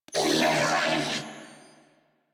Death, Female; New War; Narmer Grineer

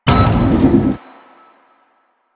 Sliding Door Chime Open; Warframe; Ambience; Nineteen Ninety Nine

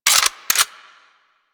Flame Thrower Reload; Warframe; Weapons

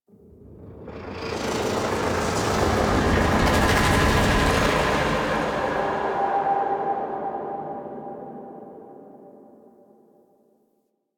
Pulsating Whoosh; Warframe; Ambience; Entrati

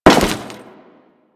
Step Default, Footsteps; Enemies; Crewman; Warframe; Corpus

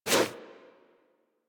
Wall Jump Foley; Footsteps; Warframe; Tenno; Wall Run

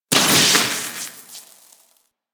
Spark Shower; ambience; props; one offs; Fortuna; Warframe; Venus Open World; Venus Solaris United

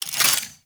Speargun Reload; DE; Warframe; Weapons; Skins; Tn Hydroid Speargun

